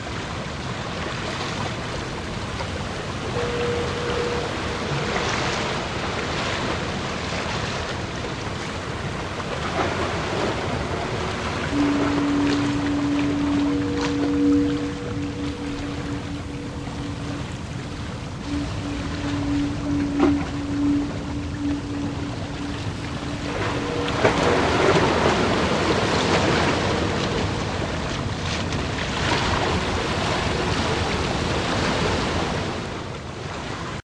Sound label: docks